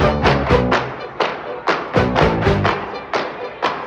strings; Phonky

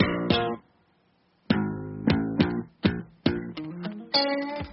bass, Loops Phonky

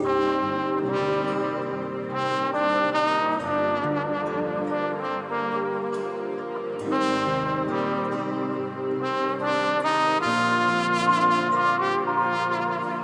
horns, Loops

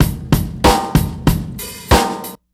sudden mute, Drum loops Boombap

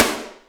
Rimshot, Snares

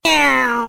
nnnrwh; ooh ahh; human

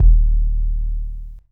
kick, Heartbeats

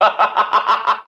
laugh demon

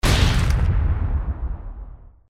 explosion; Crash Bang Boom

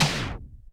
power down, Vinyl; Misc Percussion

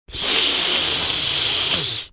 exhale

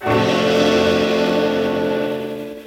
Stabs

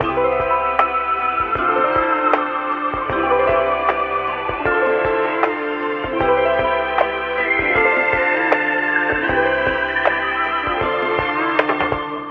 keys, phonky loops

